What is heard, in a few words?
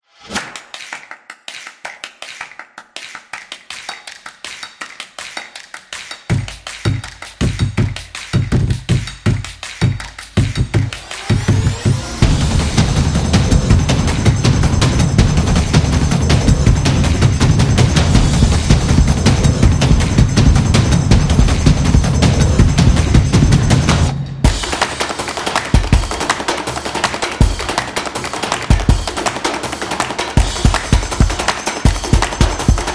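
Fast Tempo, Street percussion background music built for sport, motion, and dance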